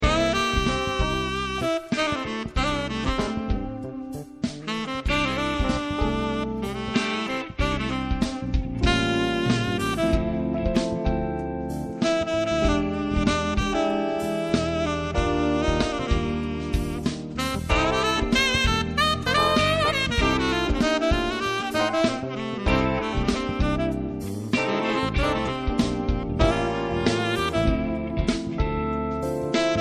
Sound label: smooth jazz